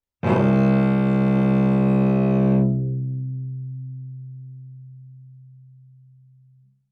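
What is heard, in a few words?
Cello arco fortissimo sul C C2; MIS Pitches - 2014; Strings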